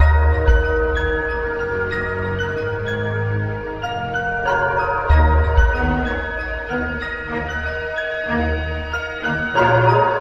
keys strings crucial moment, sad loops

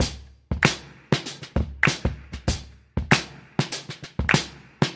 kwappy, Drum loops Boombap

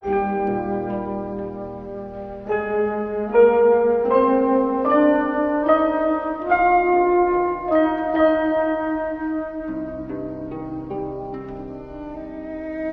strings, Sad

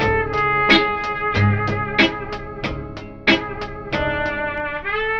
horns, Phonky loops

